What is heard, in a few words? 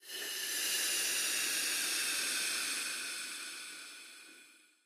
rank progress tick down